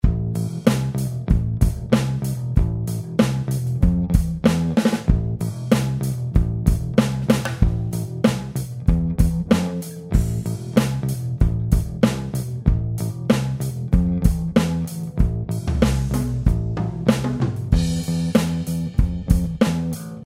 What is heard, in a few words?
bass